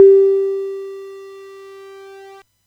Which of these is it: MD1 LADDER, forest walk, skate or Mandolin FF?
MD1 LADDER